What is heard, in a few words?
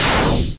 basic Grounder Shoot; F.A.R.M. Patrol